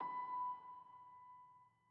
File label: Env Field Day Ground